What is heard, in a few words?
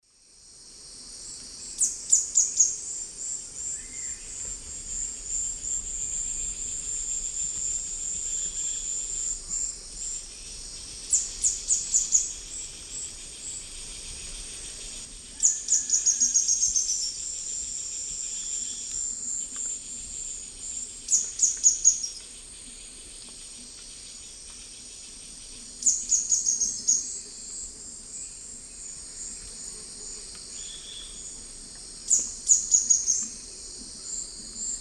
Epinecrophylla ornata, song; S. America; Eastern Lowlands